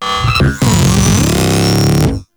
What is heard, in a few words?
Attack; Warframe; Corpus; Jackal Redux